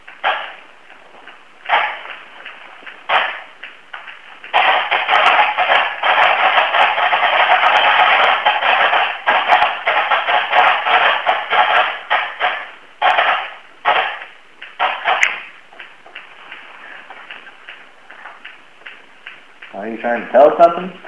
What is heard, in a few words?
walkie; Walkie Talkie Pulse; Olympus Digital VN-1800; Lost Church